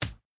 box drop

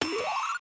wrong answer

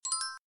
click multimedia

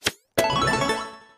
hit right answer

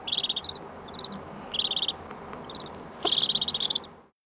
duckling

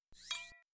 drop slide

